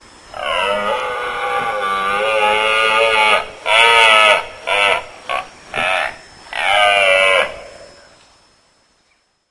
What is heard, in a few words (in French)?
Cerf